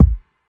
fat kick drum a sharp key, Royality free kick drum one shot tuned to the A# note. Loudest frequency: 135Hz